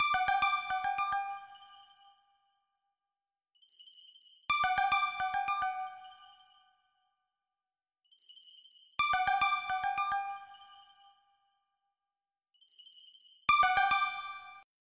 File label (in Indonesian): Nada Dering Nokia Jadul Alarm